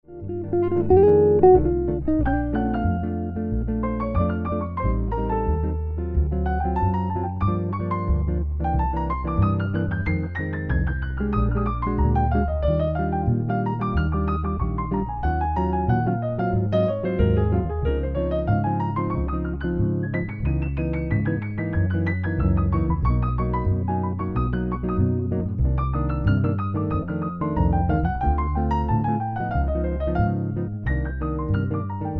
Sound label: Piano